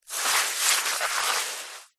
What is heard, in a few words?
foley wet sand hand movements swirls